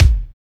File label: FACE KICK; Drums In Your FACE!